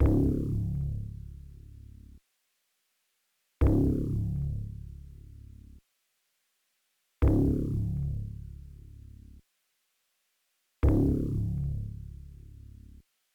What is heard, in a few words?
133 BPM C# Min Bass; Trap; Melodies; Loop Stems; Cymatics; Trap Starter Pack; Harm's Way